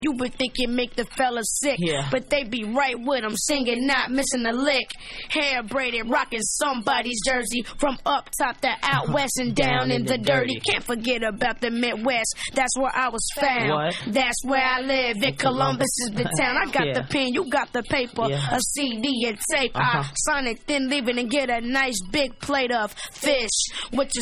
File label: Acappella